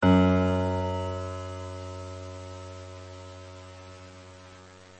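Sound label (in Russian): пианино одиночный C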